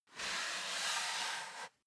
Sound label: step barefoot wood plank slide long; schritte; Footsteps